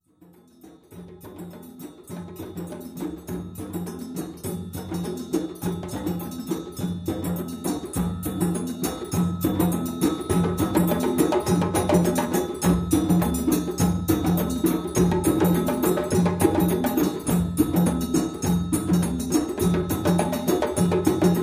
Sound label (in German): Hörprobe Drum; Drumazubis